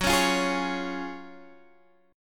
F sharp Major 7th Suspended 2nd F sharp, Guitar Drop D; x,9,11,10,9,9